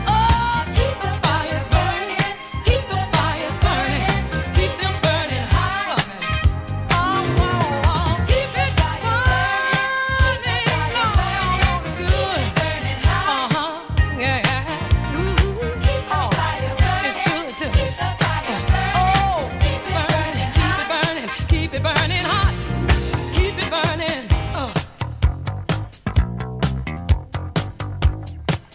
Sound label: 12 Inch Dance 80s Groove